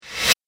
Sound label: Riser Sharp Stop; Rise And Dive Sound Effects